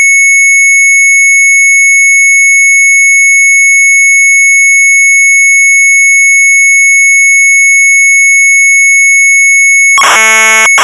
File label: MZ800 Tape; sharp; Scce Micro Tekst; retromelsarchive